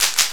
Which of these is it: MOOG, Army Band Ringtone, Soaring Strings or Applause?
Applause